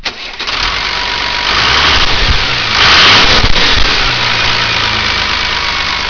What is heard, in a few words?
tractor